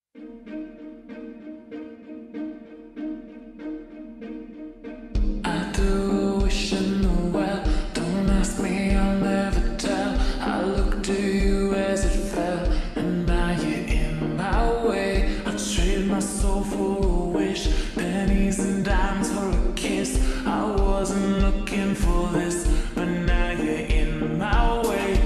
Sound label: slowed reverb